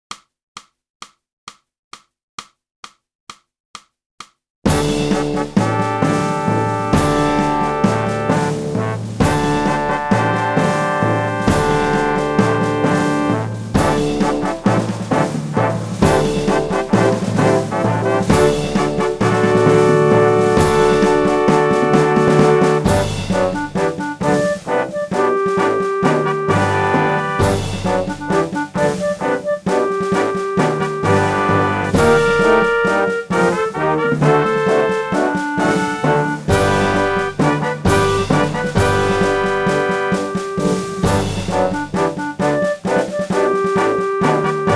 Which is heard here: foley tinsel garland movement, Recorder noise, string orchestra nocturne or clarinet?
clarinet